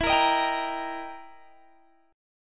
E Minor Major 7th Flat 5th, E, down, Guitar, Open D